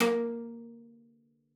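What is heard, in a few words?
CELLO spiccato A#3; 90 s Sample CDs; Xsample VOL-5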